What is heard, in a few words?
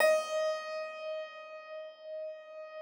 Thumb Tack Piano mp +ped, D3; Total Piano